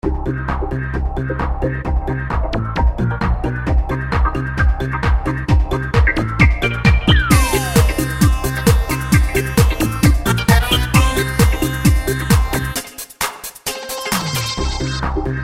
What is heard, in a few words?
Roland MC909 disco